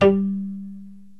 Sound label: PITZ PIANO